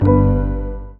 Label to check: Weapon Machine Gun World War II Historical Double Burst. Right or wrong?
Wrong